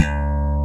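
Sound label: digital bass; AKAI S-Series CD-ROM Sound Library VOL-2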